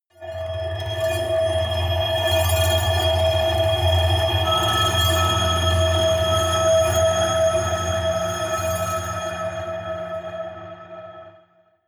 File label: glass ring rattle, drones; musikelemente